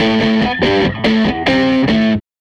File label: Les Guitar Loop